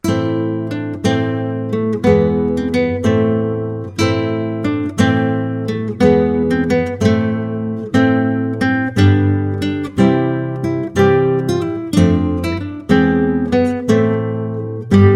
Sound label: music box song